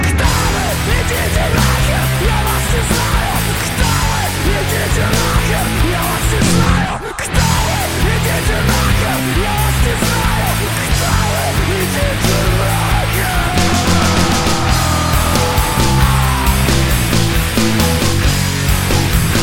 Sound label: corp melody short